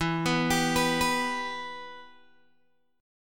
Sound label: E Minor E down Guitar Open G